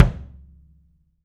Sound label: KICK, 3D Percussives, Studio Kit; E-MU Producer Series Vol. 5 – 3-D Audio Collection